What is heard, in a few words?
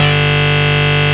rave beep G1; Best Service Alpha Dance vol.2